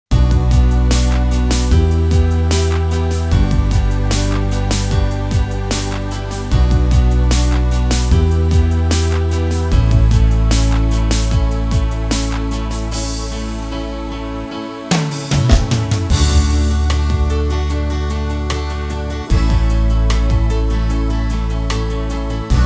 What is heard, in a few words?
Piano Ballad